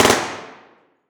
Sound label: Weapon Gun Rifle M16 Multiple Shots Interior Shooting Range; AdobeCC